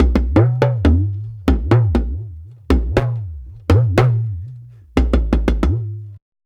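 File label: 120 bpm, Ju-Ju Talking Drum Solo; Spectrasonics; Supreme Beats; African Contemporary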